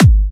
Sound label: Trance Kicks